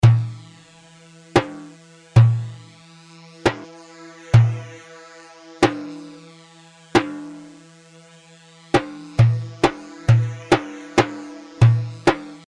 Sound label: Merjaf; Merjaf 7 مرجاف